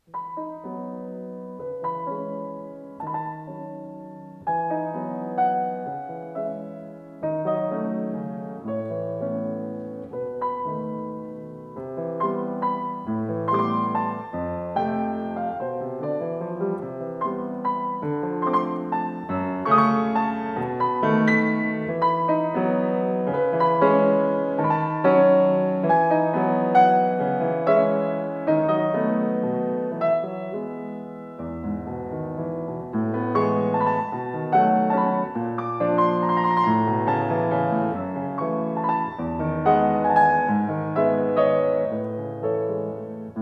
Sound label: waltz